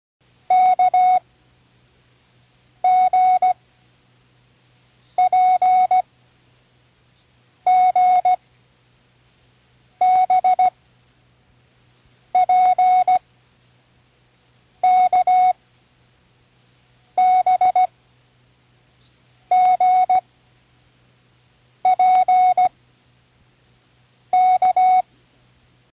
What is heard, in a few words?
random, Learning Morse Code; Tune in the World